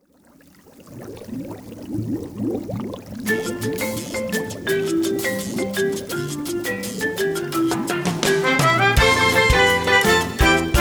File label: bow exit music